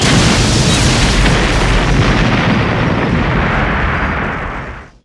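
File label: Explosion Large; Destruction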